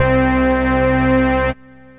Fat OSC Lead, Synth Lead

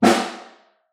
Far, Snare

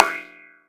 LoFi tunedrum; Loafa; Ableton Foundation FreePacks; Wicked Kits

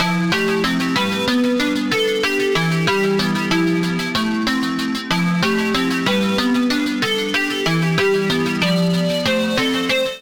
Delay C Major 94 bpm; MIDI Clips; Tonal; Accompaniment; Dulce Delay; Drive and Glow; Ableton; Factory Packs

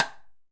Timbales A H Stick; Latin Percussion; Drums; Drum Hits; Ableton; Factory Packs

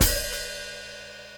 Hat Pedal Open, hihat; drum hits; Ableton; Factory Packs; Drumdrops Recording Hybrid Kit

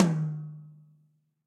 Tom High Deep Snare Dynamics; Drum Hits; Ableton; Factory Packs; Session Drums Club; MPE Hits

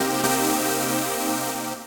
Dirty Organ, Synth Lead; Ableton; Skitter and Step

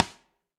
Snare Sidestick Classic Muffle; Drum Hits; Session Drums Studio; Standard Hits

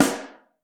Mono, Snare; Ableton Foundation FreePacks; Snare Designer

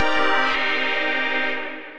Shimmer Piano, Synth Keys; Chi Town

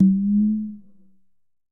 Tumba C Slide, Conga; Latin Percussion; Drums; Drum Hits; Ableton; Factory Packs

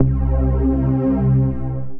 Square Pulser, Synth Lead; Ableton; Factory Packs; Synth Essentials

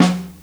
Snare Mono; Ableton Foundation FreePacks; Snare Designer

